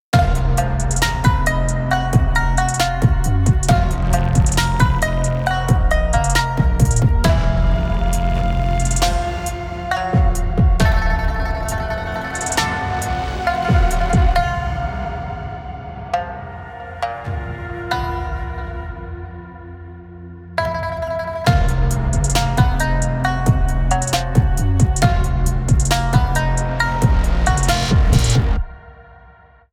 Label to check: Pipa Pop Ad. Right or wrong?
Right